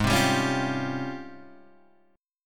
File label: G sharp Major 13th, Guitar, Standard; 4,3,5,6,6,3; JGuitar